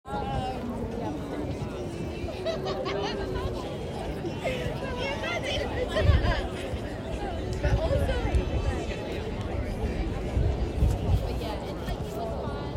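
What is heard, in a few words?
East Meadow Park, laughter, chatter, sizzling of barbecues and dogs running, as well as subtle blowing of wind, and in the distance, the hum of the industrial city buzz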